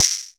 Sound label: perc mod tail; percussion